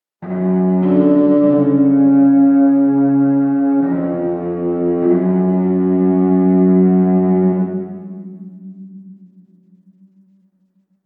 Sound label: cello; darkness within 2 the dark lineage